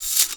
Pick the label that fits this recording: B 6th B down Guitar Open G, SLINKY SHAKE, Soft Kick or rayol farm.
SLINKY SHAKE